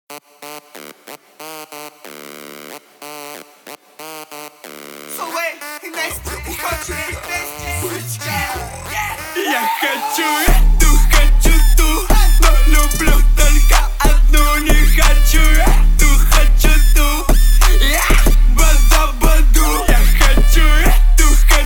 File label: 35-37Hz Low Bass